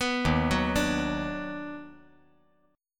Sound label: D sharp Minor Major 7th Sharp 5th